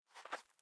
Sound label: Footstep Sand Jump; Main Character